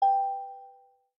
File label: Samsung